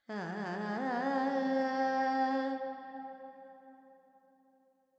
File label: Verb Charukesi, Carnatic Female Alaps